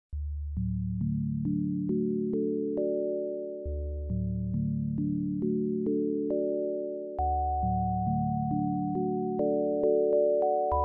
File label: meditation bell